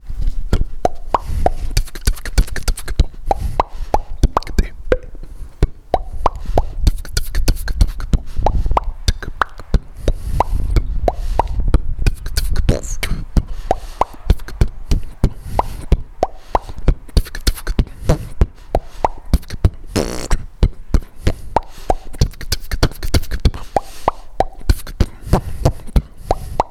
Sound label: Dry Didge Sample